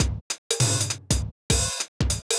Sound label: drummach